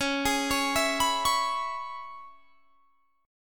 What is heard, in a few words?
C sharp Minor 7th C sharp, chord; C#m7 arpeggiated